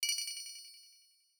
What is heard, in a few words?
ui good